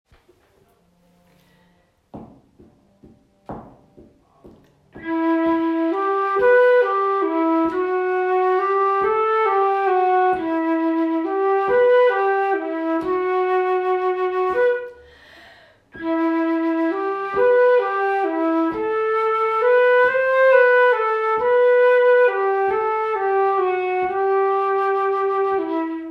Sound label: Flute; flutes grade 2